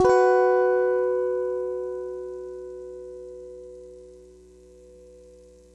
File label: guitar